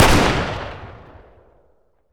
m3s90 fire, shotgun; weapons; garrysmod; m3s90p

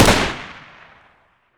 sg550 fire; garrysmod

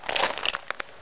deploy, weapons; garrysmod

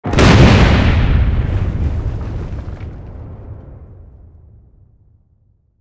mortar explode; explosive m224; garrysmod

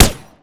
ak47 silenced, weapons; garrysmod

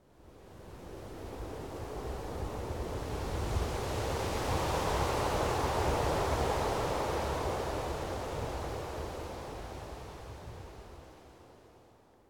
wind; garrysmod; stalker

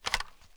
open; garrysmod; mg vollmer

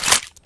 scarh mag out; garrysmod

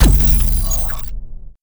camo off; garrysmod

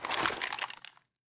tar21 tap, weapons; garrysmod

